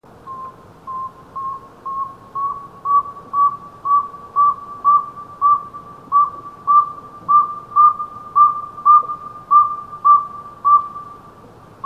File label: saw whet